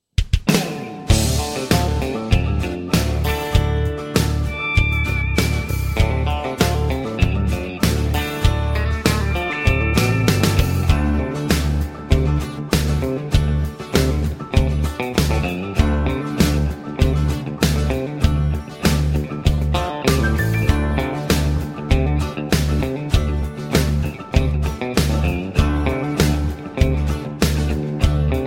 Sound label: D No Backing Vocals, backing tracks